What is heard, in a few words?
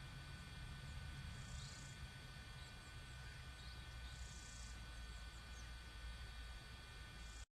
woodpeckers